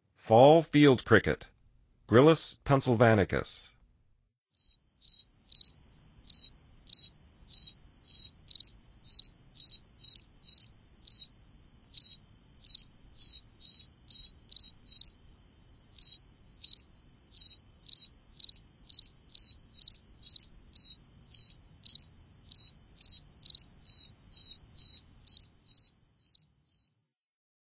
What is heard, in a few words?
Fall Field Cricket; N. America